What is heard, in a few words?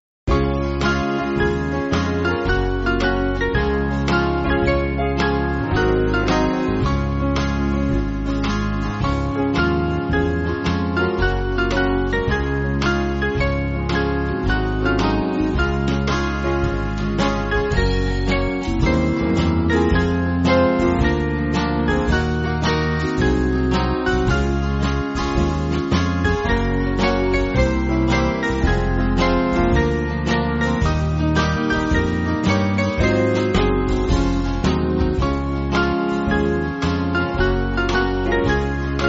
Band